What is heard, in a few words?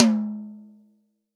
TOM, Real Drum Kit, POP KIT